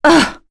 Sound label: Damage, Vox; en; Lewsia B; kingsraid